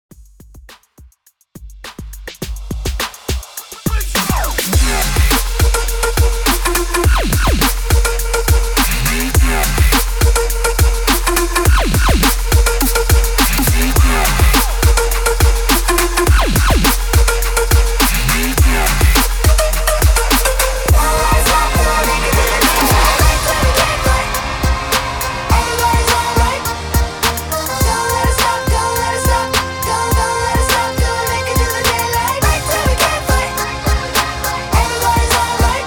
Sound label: REDRUM 104 BPM